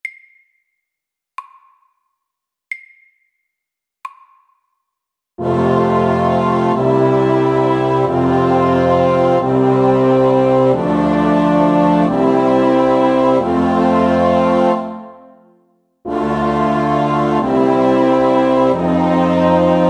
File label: Chromatic chorale SAKTE